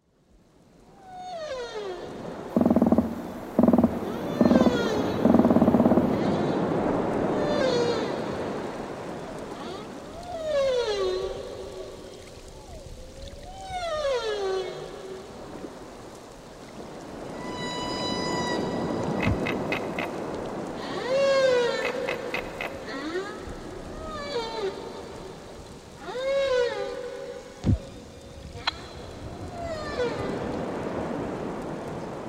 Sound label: marine composite